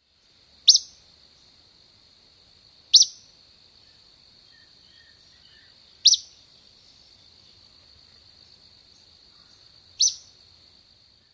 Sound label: calls num2